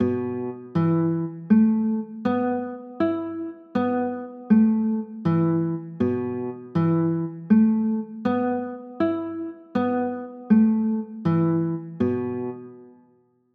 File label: b 7sus4, chords